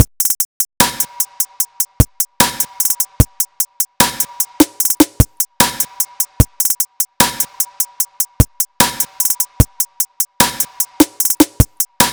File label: Drum Loop 150; embers; Monopole